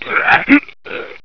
pain

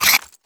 load, rpg7

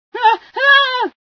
scream, female sci

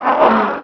alert; unreal

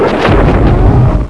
blast

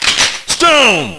stone

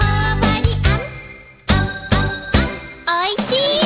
gtr

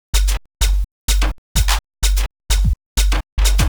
hihat

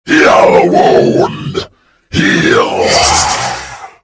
lizard heal

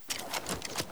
draw, weapons

